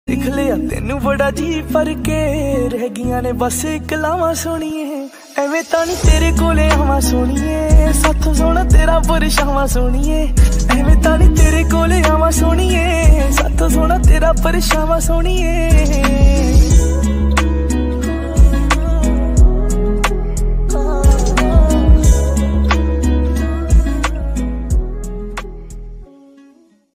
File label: punjabi song ringtone